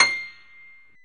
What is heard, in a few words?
PNO, Grand Pno, Roland